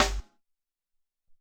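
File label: Hop Snare, drums; Alesis SR-18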